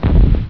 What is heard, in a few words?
THUMP